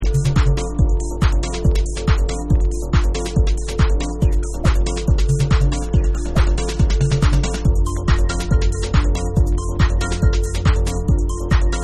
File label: Mad Strings